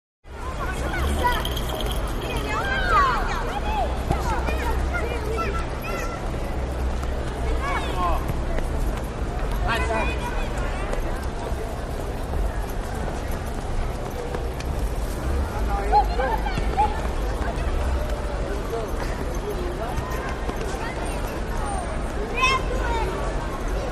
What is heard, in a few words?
Rome Italy Piazza; italian